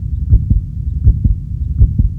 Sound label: health low heart loop